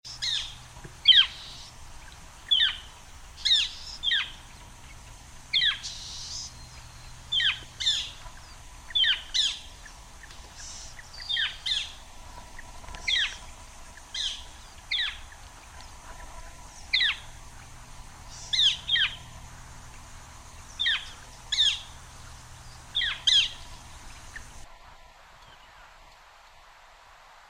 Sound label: Flicker Sound